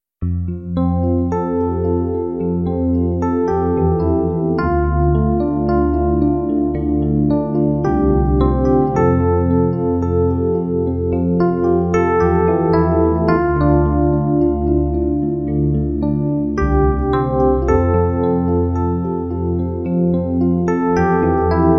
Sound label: CLREPNO; PLG150DX